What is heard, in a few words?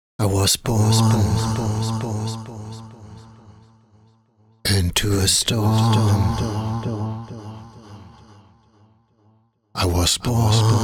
vox deep fx